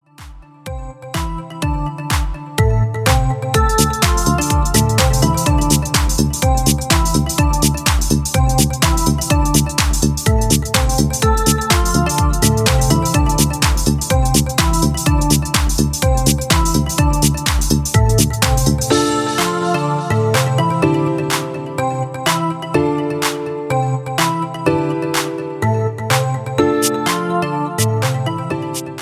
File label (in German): musik hoerprobe